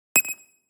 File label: HUD futuristic button click